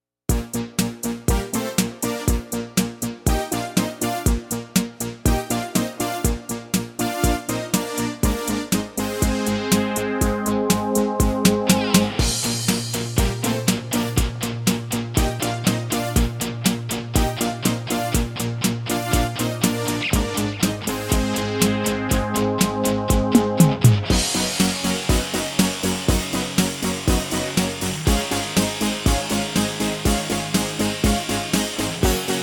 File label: 80s Cheeze